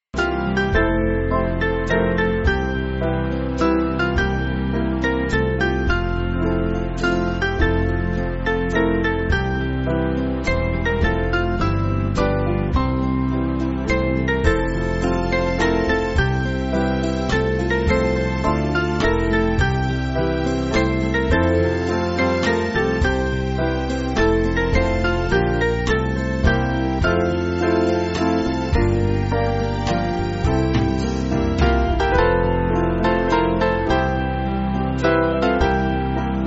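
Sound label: Band, Backing Files for Churches; Safely Through; Sabbath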